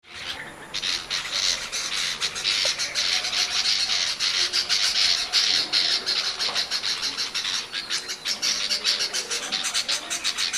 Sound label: Red vented Bulbuls scalding calls